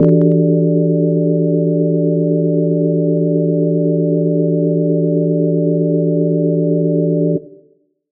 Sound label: Keys, E minor